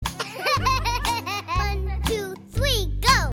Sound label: cute baby laugh